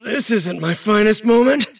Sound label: move pain; davion; dota